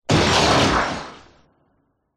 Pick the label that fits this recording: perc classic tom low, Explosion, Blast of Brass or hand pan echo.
Explosion